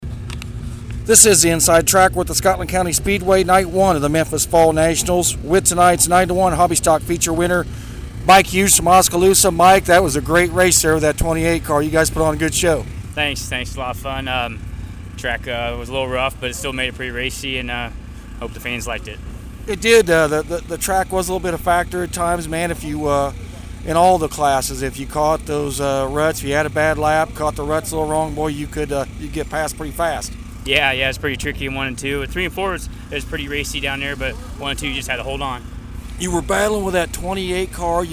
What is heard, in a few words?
SCS IMCA Type Hobby Stock; Scotland County Speedway - Memphis, Mo.